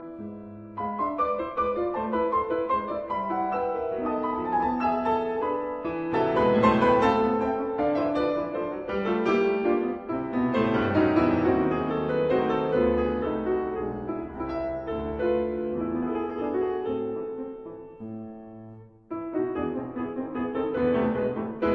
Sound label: Menuet in A minor; Rameau